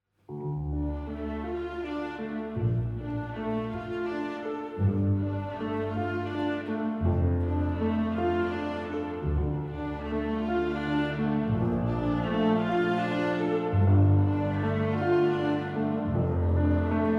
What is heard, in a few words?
instrumental; Matrimonio di Michela